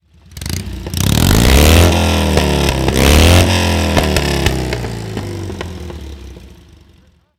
Sunbeam S7

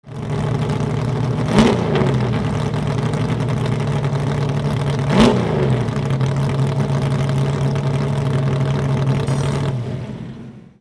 nascar chevy rev

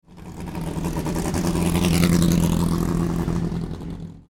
Lister MG

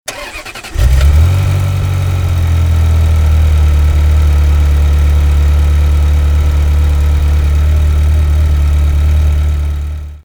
Ferrari 355 under hood start

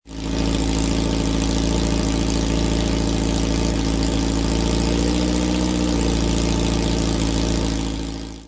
porsche 356 idle

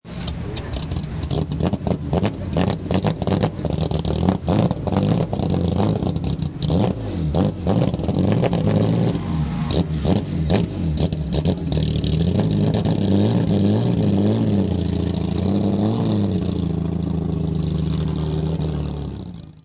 jag d type rev

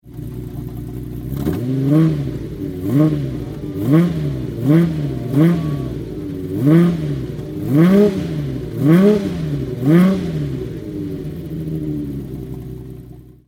ferrari f50 rev

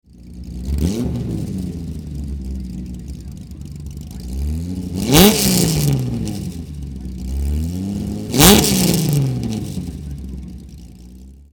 Valiant Charger, engine; 2003 Skope Classic; Ruapuna Raceway, Christchurch, New Zealand

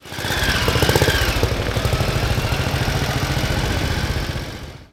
Moto Guzzi, engine

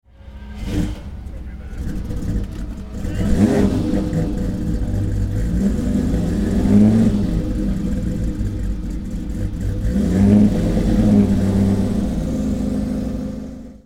Ferrari 250 GT Interim

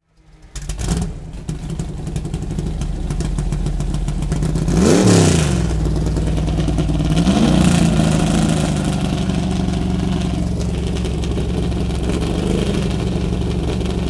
Corvette; 1969 Greenwood Corvette

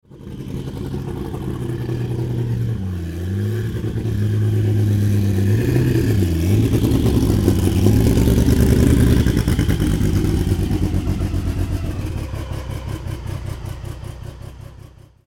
Porsche, engine

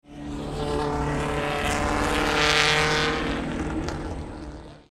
1964 MGB

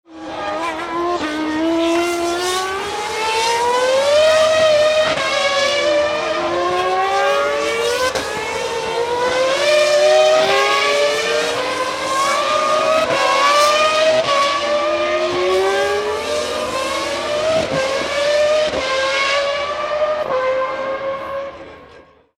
f1 medley

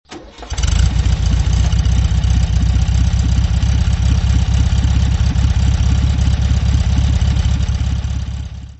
61 beetle start

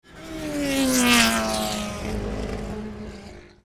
Chevron, engine

